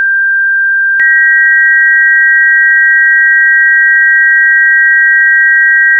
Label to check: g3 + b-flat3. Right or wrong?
Right